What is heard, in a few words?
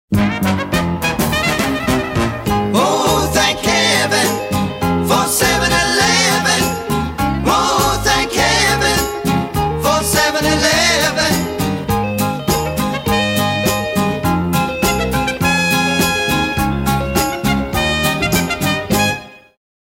Trumpet Keys